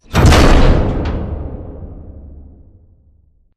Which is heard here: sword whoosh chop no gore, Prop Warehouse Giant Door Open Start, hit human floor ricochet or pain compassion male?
Prop Warehouse Giant Door Open Start